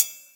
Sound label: cymbal; don t be afraid the first toy